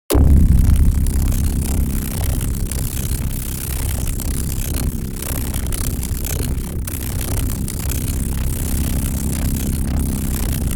Unstable energy source energy field

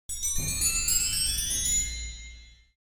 Cartoon magical chimes ascend

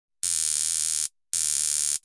Taser buzzing close perspective

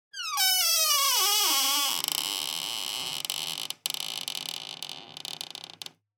Cinematic wooden hinge screeches

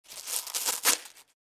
Cabbage squeak

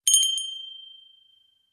Store doorbell chime

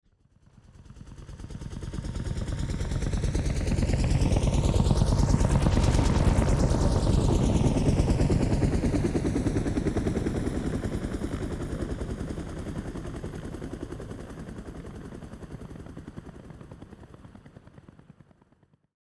Helicopter flying overhead slow pass by

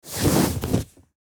Sitting down on a sofa; household

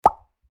Bar chart pop in animation infographics

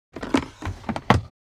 Airplane interior passenger tray table down; vehicle

